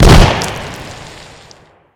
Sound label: Explosion; Pizza Tower early build test ost